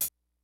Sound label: FUNK, DRUMS; Kid Nepro; Elite